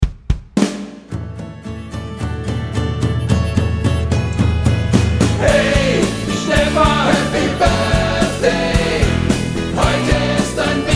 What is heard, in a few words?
Happy Birthday Stephan